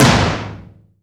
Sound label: TOM, Drums; Best Service; Real Mega Drums VOL-1